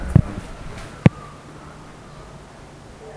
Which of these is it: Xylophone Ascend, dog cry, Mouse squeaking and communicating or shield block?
dog cry